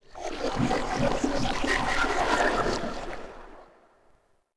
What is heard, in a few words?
City Noise; Ambient; Sid Meier's Civilization 4 Complete; Beyond the Sword